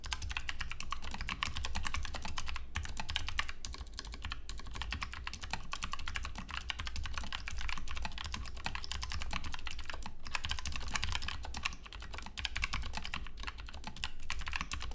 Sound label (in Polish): test dzwieku, Redragon Anubis K539